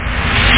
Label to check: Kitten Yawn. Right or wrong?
Wrong